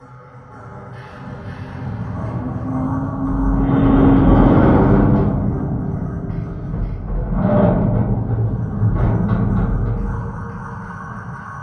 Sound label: Creak; Barotrauma; Room FX